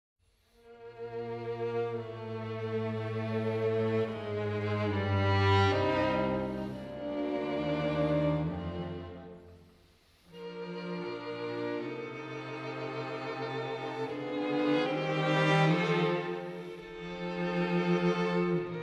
String Quartet in B-Flat Major, Adagio ma non troppo; String Quartet No. 13; Op. 130; Ludwig van Beethoven; Beethoven - String Quartets Nos. 1-16